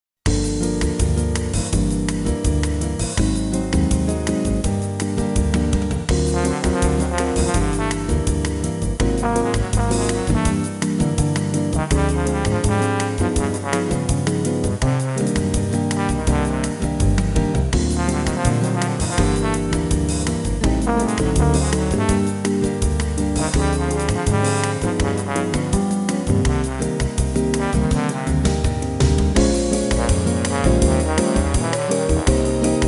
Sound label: Jazz